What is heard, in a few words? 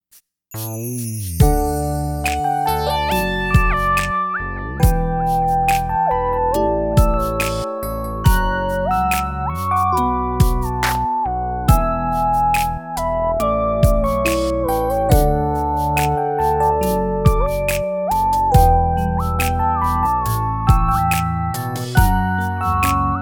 R&B ballade; 감성 POP